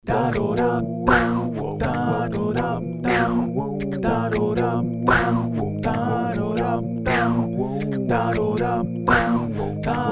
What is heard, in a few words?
Phoenix Audio Ascent One Vocals Collage Dry